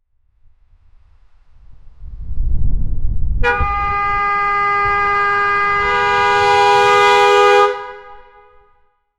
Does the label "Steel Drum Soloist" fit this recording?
No